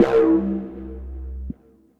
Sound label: Subtle Donk, Bass; Ableton; Glitch and Wash